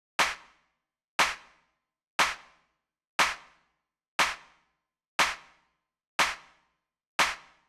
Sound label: Clap, Nu Disco, 120bpm Cm; Zero-G; Construction Kits; Legacy